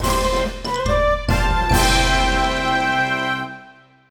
Fanfare!; Kirby and the Forgotten Land

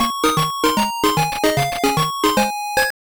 Lose a Life; Castle of Illusion; Master System

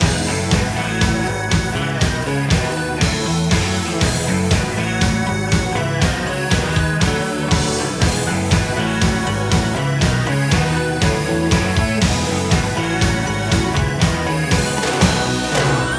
Mambo; Air Twister

